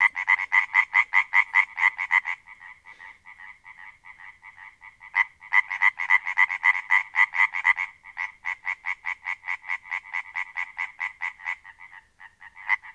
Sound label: hyla arborea, frogs